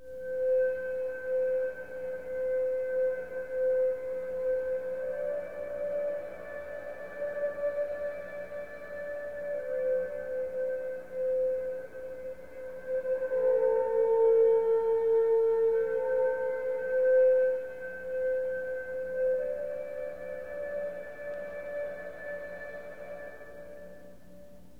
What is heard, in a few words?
flute, Loops, Sad